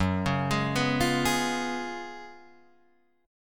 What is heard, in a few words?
Gb Major 7th Suspended 2nd Suspended 4th, down, Guitar, Drop D